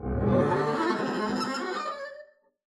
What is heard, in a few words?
arco noise moan pig long, double bass; dsmolken; noises